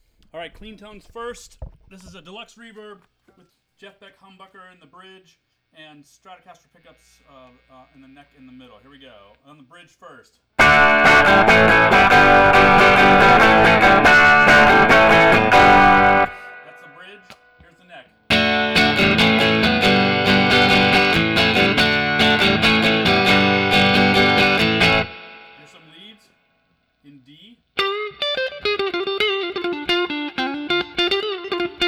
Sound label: Clean Dirty